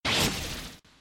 Attack; Battle Day Brawl

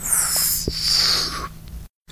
bubble blow; Henry Danger - Day Of Danger!

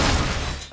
tv slam; Cloudy with a Chance of Meatballs - It's Raining Man

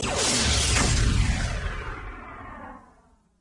game end; The Powerpuff Girls - Trail Blazer; NextlevelGames

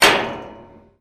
hit metal; Gumball - Go Long!

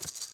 sfx add sprinkles; Dorothy And The Wizard Of Oz - Cookie Magic

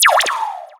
correct; Ben 10 - Alien Catcher

